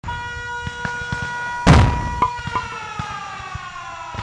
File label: Self-Destruct; Face-Off